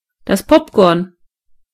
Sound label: pop; gcompris